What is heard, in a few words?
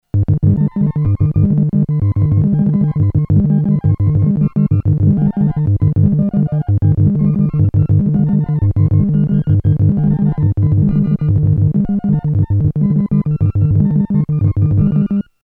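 synth hypno, analog polysynth; Solton SM100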